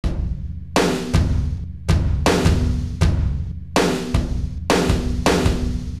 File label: batterie clean, metal